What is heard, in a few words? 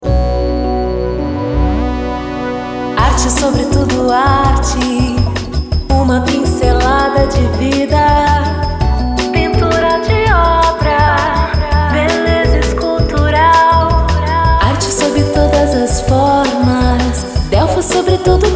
JINGLE Institucional